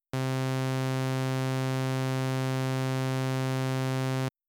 saw note; Wiwi Digital music technology

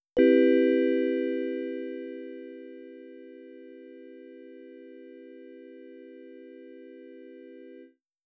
adsr piano; Wiwi Digital music technology

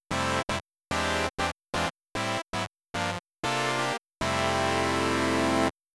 filter; Wiwi Digital music technology

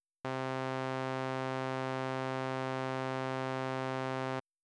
saw mid; Wiwi Digital music technology

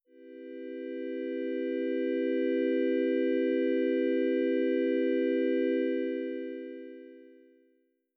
adsr string; Wiwi Digital music technology

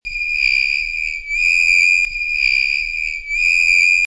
blackboard chalk

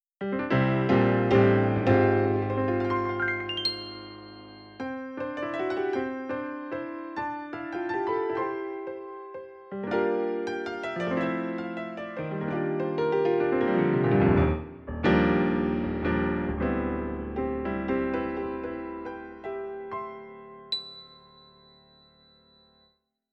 1layer piano; Wiwi Digital music technology

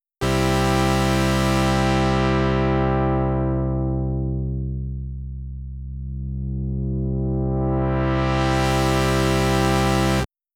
dynamic Filter; Wiwi Digital music technology

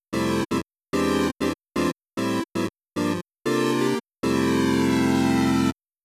filter; Wiwi Digital music technology

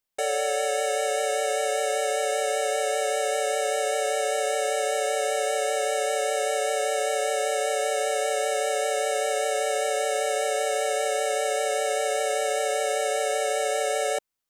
pitch; Wiwi Digital music technology

